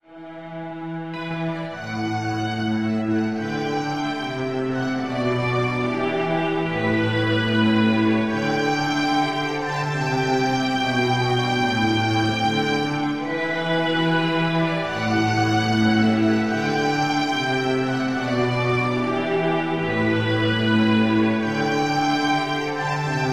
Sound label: Good Night Theme Orchestral; Sim City SNES